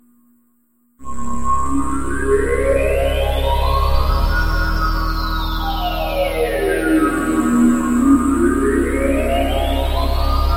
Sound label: string bass